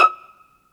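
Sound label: PIZZ VLN B4, violin; AKAI S-Series CD-ROM Sound Library VOL-2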